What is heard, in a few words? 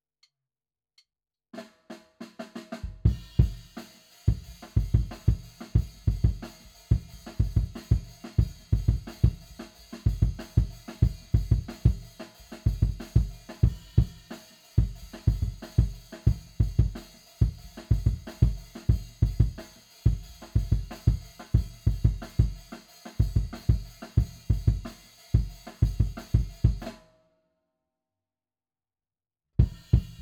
Kick IN